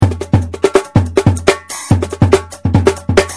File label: jungle